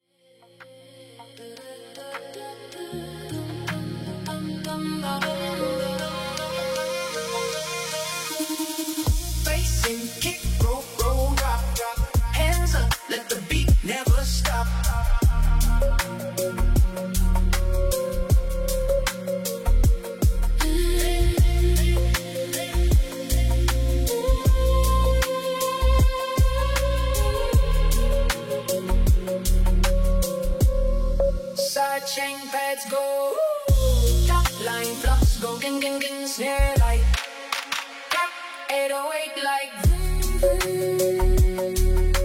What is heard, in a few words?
boom bup